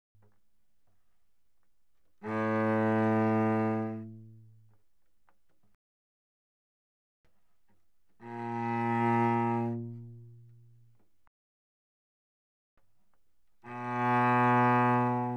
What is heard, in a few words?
Cello arco mf sul C A2Gb3; Strings